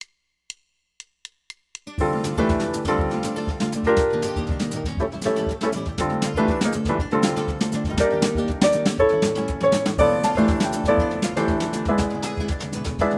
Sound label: Jazz Alt Style Bass play along